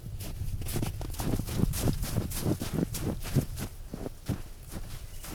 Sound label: snow run; anemoi